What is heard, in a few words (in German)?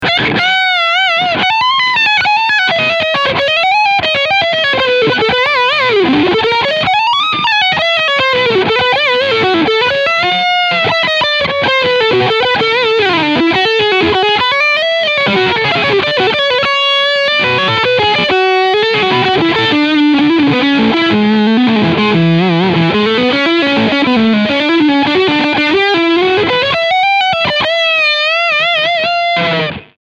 Leadsound Steg HB